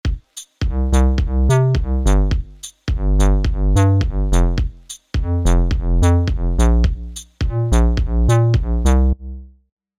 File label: Bass